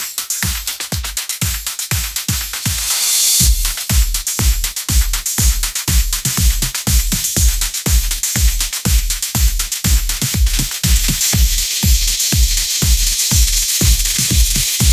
upbeat pop melody, ringtones

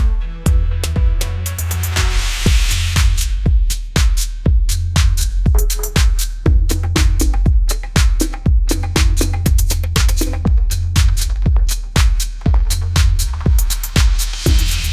sunlight groove

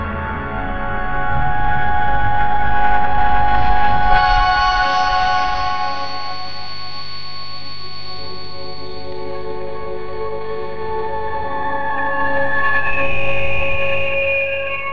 ambient soundscape, ringtones

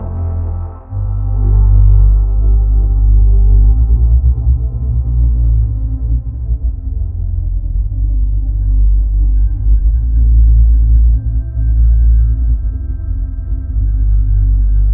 ambient soundscape

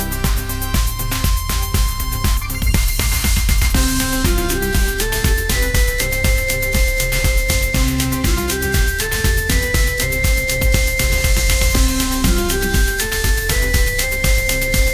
upbeat pop melody

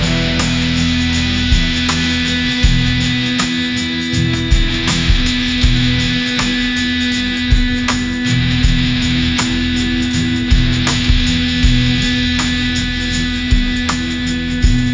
swampfire electric drive

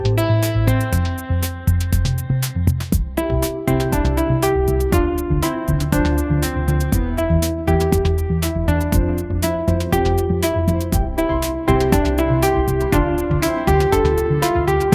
upbeat pop melody, ringtones

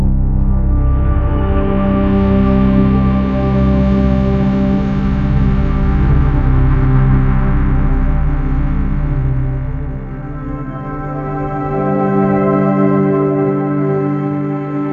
ambient soundscape, ringtones

